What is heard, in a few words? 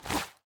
flap